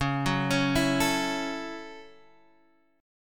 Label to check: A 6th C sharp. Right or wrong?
Right